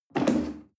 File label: chair wood take slide hard